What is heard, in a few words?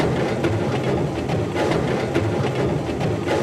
reel spin; netent